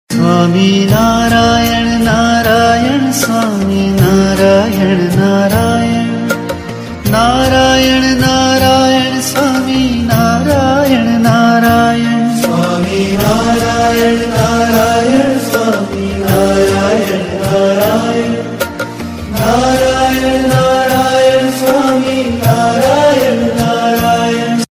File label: Swaminarayan Dhun